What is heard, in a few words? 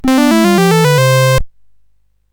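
Number Tumbler, MOS 8580 SID chip music, games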